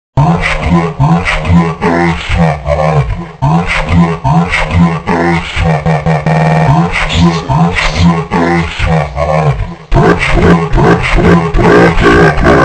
FUNK Super Slowed

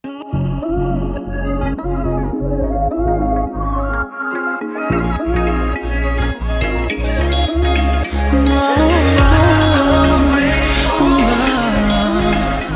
Japanese Music

